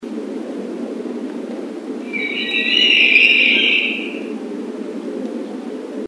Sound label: POCUPINE SCREAM